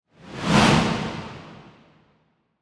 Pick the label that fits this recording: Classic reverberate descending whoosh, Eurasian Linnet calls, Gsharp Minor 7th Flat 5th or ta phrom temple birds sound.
Classic reverberate descending whoosh